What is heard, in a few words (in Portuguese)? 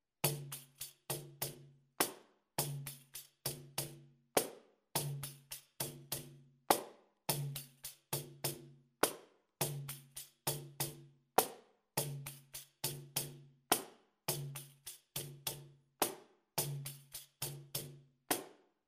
capoeira toque slow, pandeiro